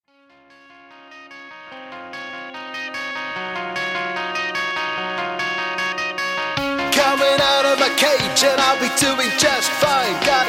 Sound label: Rock Pop Band; Surrey